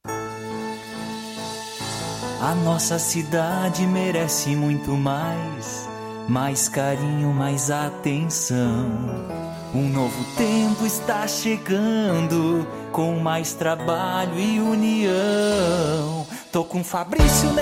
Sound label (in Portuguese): Jingle Politico; Um novo tempo 2020; jingles eleitorais